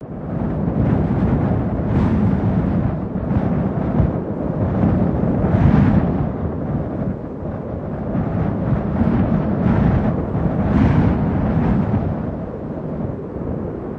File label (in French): bruit du vent